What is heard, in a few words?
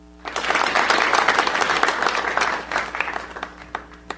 applause